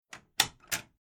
Household Old House Large Door Latch Open Metal Three Parts; Creaky Door Sound Effects